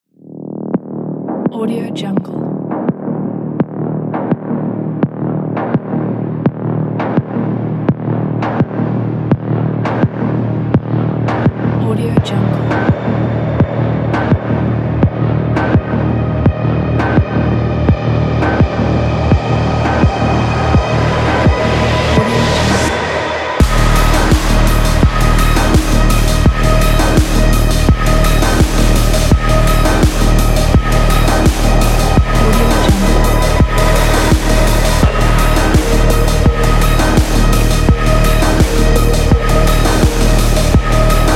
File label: Dark Ambient Industrial